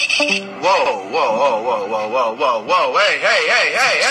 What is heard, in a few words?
wowo hey heye heye